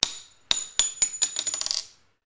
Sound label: shells 9mm; izanagi 2022